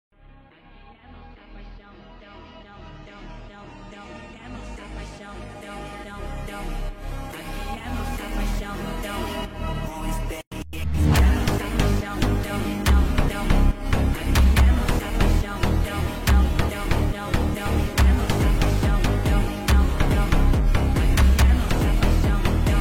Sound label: sped up